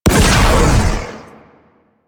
Crewship Heavy Cannon Fire; Vehicles; Crew Ships; Weapons; Warframe; Rail Jack; Corpus Crew Ship

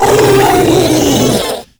Proto Infested Ancient Vocal Attack; Warframe

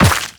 Run Dirt, Footsteps

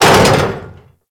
Step Metal Debris; Enemies; Steps; Warframe; Corpus; Arachnoid Camper

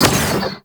Cannon Fire; Warframe; Sentients; Sentient Eidolon Ghost Caster; Weapon

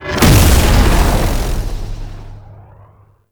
Lancer Frenzy; Warframe; Tau; Prime Grineer

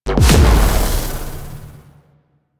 Alt Proj Explosion, Blastor Pistol; Warframe; Weapons; Corpus; Plinx

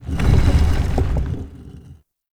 Stone Pedestal Start; UnderCity

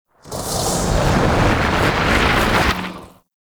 Void Angel Teleport Return Build Up; Abilities; Warframe; Zariman

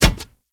Kubrow Step Metal, Footsteps; Enemies; Warframe

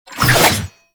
Bayonet Melee Charge Up Spin; Warframe; Tenno

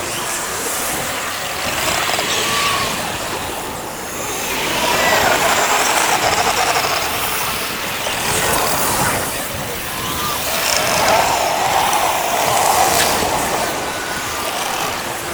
Aura Loop; Warframe; Entrati; Conquest; Vampyric Liminus

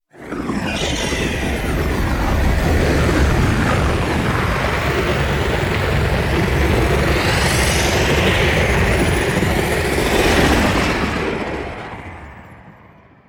Blue Sky Liset Mountain Pass Drop Off, vehicles; Warframe; Liset Flight

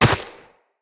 Operator Run Snow, Footsteps; Characters

